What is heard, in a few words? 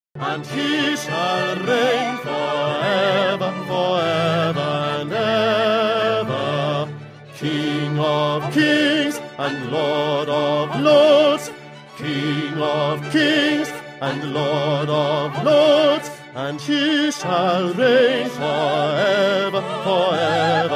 Audition Practice Hallelujah Bass; ESYO Melodies